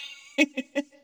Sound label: arm laugh